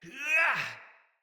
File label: Attack Heavy, Battle Barks; Envoy